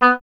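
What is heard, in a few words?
Wind OBOE B; Miroslav Vitous - Solo Instruments VOL-2; Solo lnst. II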